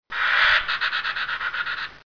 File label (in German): birkhahn